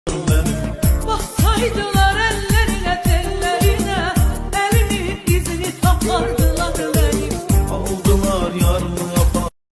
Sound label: azebass azelowstyle, azerbaycan musiqiler